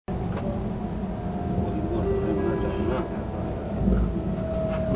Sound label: Chime; meitetsu